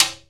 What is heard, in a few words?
piala, timbales; E-MU Producer Series Vol. 2 – More Studio Essentials; Composer; Timbales Xswitch